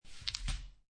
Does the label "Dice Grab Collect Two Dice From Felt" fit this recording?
Yes